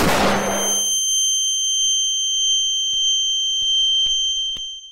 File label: flashbang sound effect